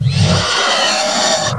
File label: roar